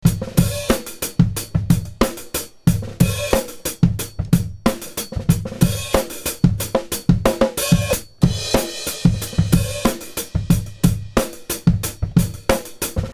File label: beat